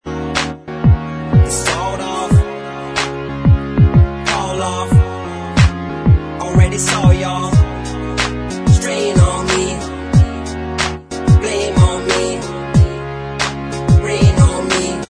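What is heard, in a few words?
backing vocals, track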